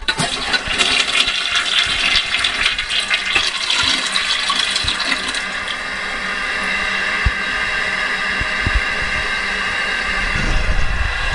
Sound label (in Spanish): lavar